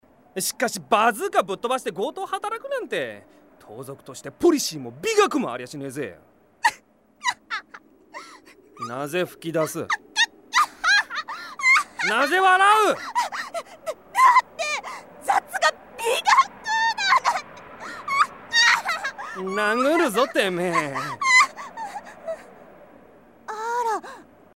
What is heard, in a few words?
laugh